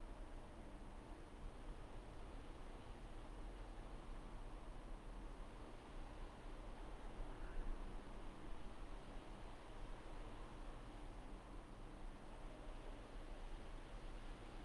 fire department siren

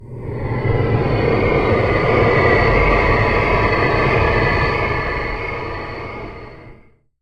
dark portal wind effect; sonniss gdc

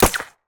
gun pistol shot silenced; fvtt sonniss gdc audio

